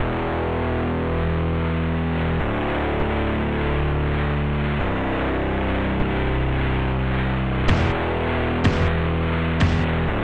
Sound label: MUSAK